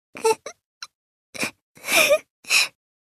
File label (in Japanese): エモーション（悲しむ）「えぐっ…ひっく（すすり泣き）」